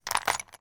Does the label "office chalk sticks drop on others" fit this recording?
Yes